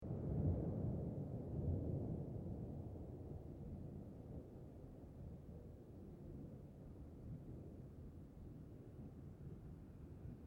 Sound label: Thunderstorm